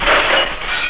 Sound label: glass break